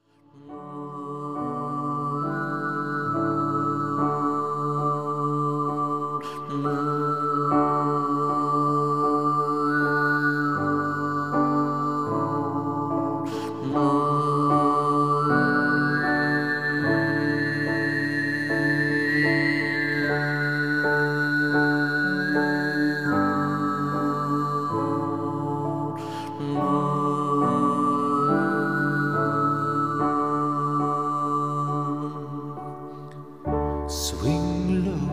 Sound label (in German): Piano Gesang; Piano, OTG, Gesang; Swing Low Sweet Chariot; Lieder für verstorbene Erwachsene